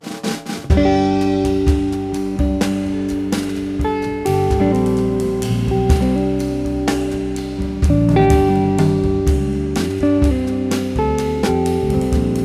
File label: Cautious Tones